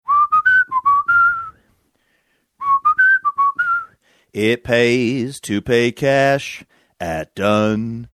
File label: whistling singing it pays to pay cash